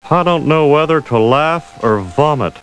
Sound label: Laugh